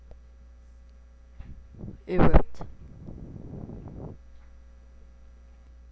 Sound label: erupt